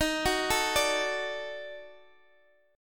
Eb Minor Major 7th Flat 5th Eb down Guitar Half Step Down, chord; EbmM7b5